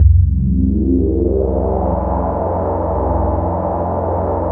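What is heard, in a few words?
JP8 filter sweep C, Jupiter 8; Big Fish Audio - First Call Vintage Keyboards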